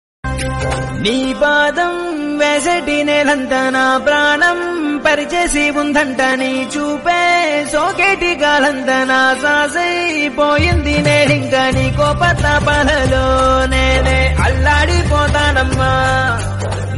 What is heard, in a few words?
songs telugu